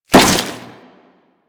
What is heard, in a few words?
Crewman Step Flesh, Footsteps; Warframe; Corpus